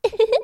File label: laughter anime style A major